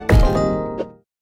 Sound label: notify